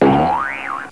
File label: boing